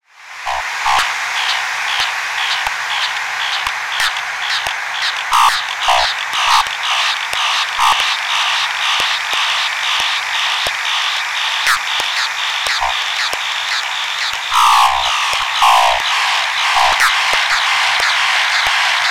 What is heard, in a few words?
Little Mud Creatures FX; AudioThing Lines